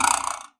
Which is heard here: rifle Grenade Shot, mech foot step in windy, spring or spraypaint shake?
spring